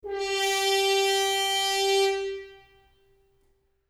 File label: mute horn